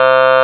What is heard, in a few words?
android, Digital Synths